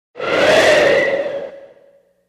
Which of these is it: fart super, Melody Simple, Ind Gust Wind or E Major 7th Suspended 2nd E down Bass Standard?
Ind Gust Wind